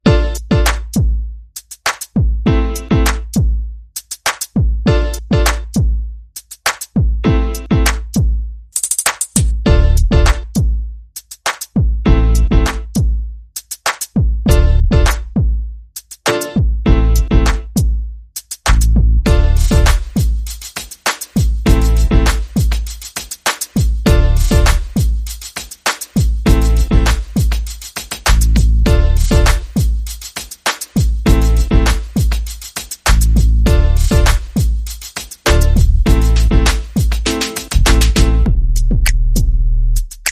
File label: No Synth Lead